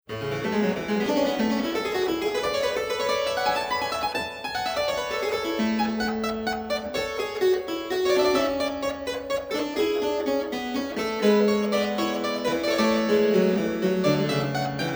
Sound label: Prelude, Flemish harpsichord